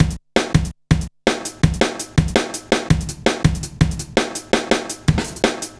Heavy Live, Jungle, Drum Loops 165.5; Zero-G Jungle Warfare 1, 2 & 3

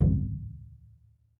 TOLOWA DR, American Natives; E-MU Formula 4000 Series Vol. 4; Earth Tones

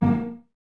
Cellos Pizz A#3, String Ensembles; Miroslav Vitous

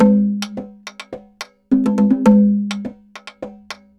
tambora, merengue; Best Service ProSamples vol.14; AKAI

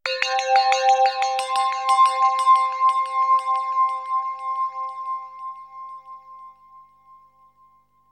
BELL ECHO; DRUMS & PERC

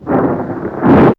Sml Crak, Weather; Fairlight CMI IIe

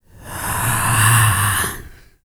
VOX FX, BREATH FX

